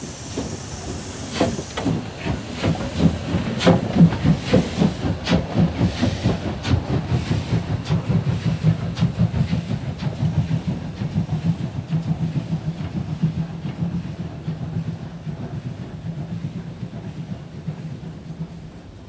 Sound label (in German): Dampflok DB 64 491 Anfahr